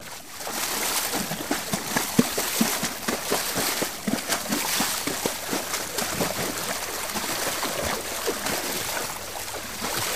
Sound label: People Splash A Lak, splashes